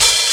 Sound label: soft sounding open hi hat a key, Royality free open hi hat sample tuned to the A note.